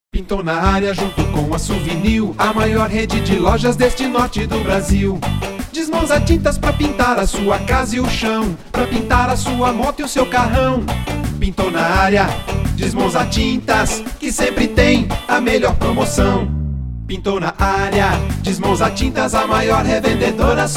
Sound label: tintas jingle; Dismonza